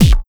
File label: Kick Dist Hard Electro; Beyo